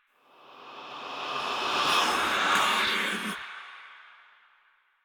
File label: Cave Whispers; Ambience; Orokin; Warframe; Stalker Cave